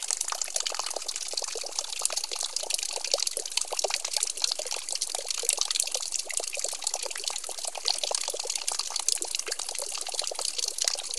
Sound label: water fontain